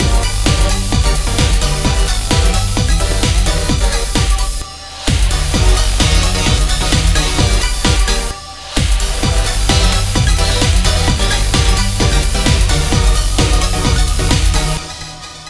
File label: octavo techno